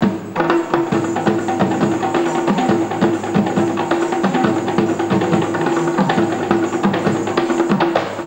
PERC, India; Best Service; Ethnic Journey Sound Cube

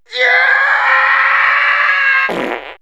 Comedy Crepitate; vox mix; X-Static Goldmine

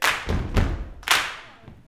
108 CLP FT, Vocal Blues & Soul CLAP FEET

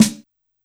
Snare H Soft; Yamaha PSR-E323 Standard Kit; drum machine

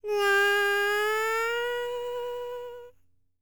HIMALAYAN, World Voices; ILIO; Vocal Planet VOL-5

